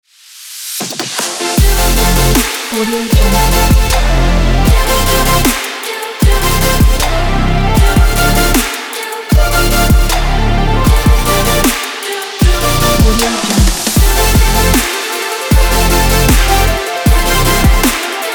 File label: future bass intro opener; spacemood